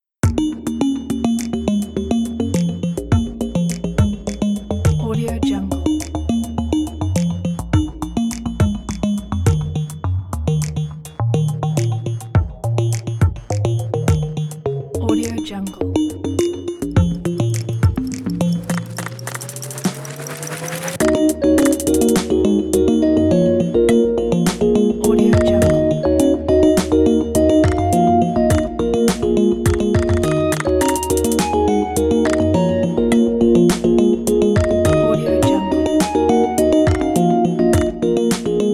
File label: uplifting techology